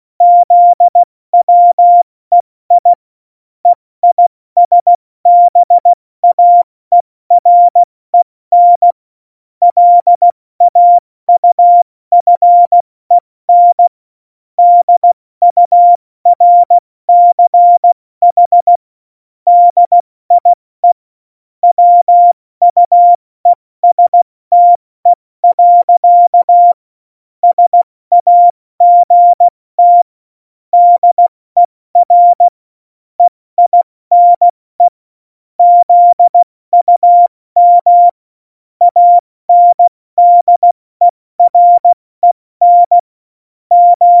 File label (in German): Witz, Morsekurs, 70 F80; Witze